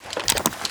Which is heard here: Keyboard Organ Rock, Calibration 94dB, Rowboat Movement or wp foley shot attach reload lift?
wp foley shot attach reload lift